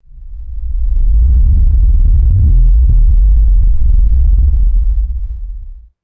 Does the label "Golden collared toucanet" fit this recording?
No